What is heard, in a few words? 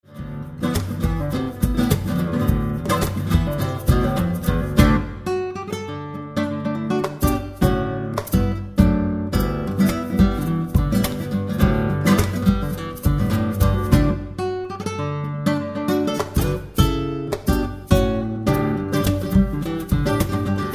funky